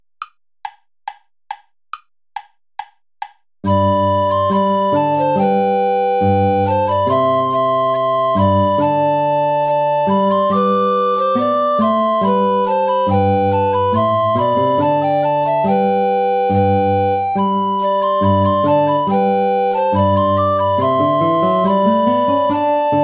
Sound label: play along without alto recorder; praetorius michael ballet